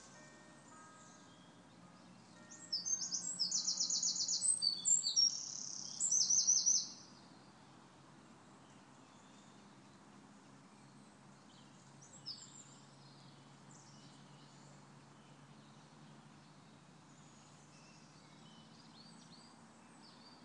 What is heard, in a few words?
dusk birdsong, loud birds near start and end, striking clock at start